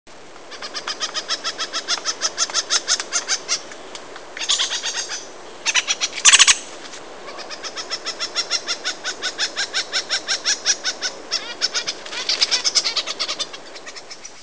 pale billed woodpecker

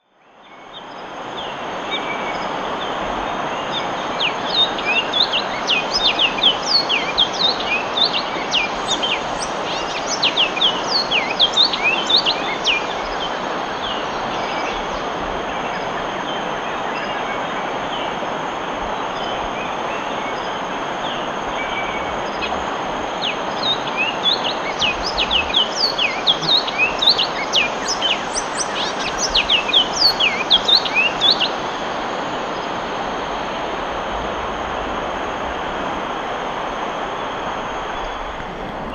great billed seed finch

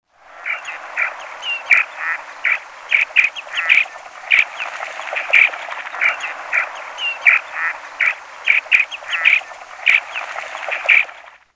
pectoral sandpiper

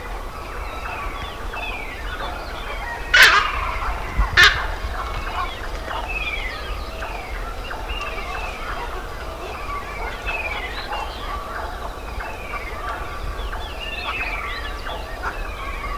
indian pond heron

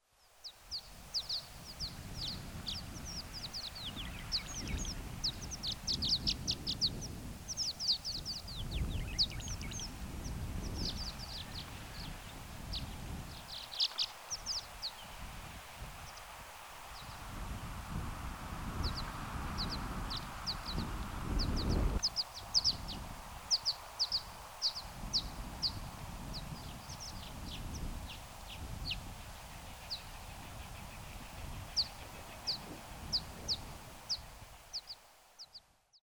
bahama swallow

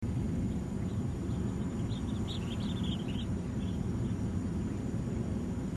two-banded plover

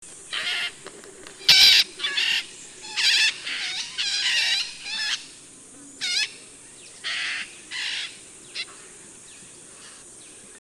long tailed parakeet

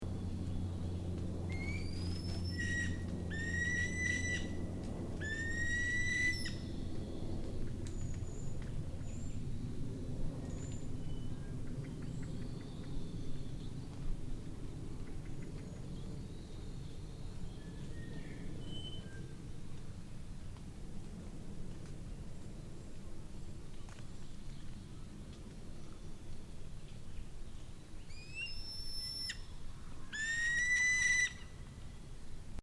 new zealand falcon

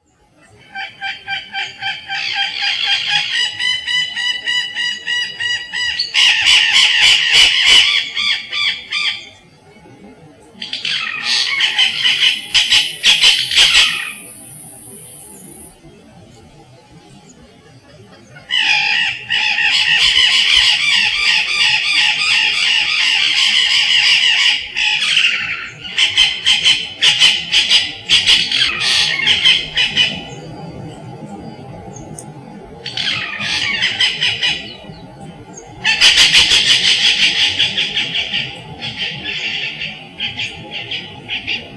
white fronted parrot

